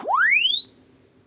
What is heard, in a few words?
Press Two Sides Chirp; Samsung 4 Recordings